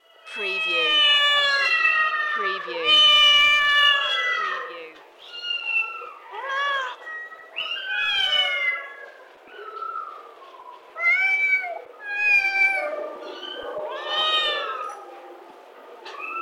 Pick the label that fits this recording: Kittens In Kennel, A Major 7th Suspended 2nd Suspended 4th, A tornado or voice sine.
Kittens In Kennel